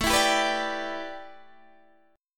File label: A 9th Suspended 4th Guitar Open D, chord